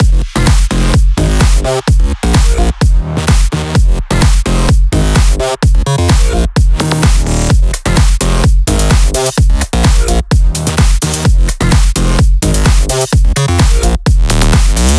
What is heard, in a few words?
128BPM Mix; Vengeance Samples; Neon Kit